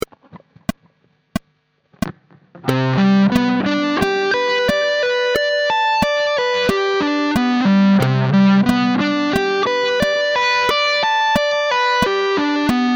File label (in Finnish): Cmaj13omit3 patteri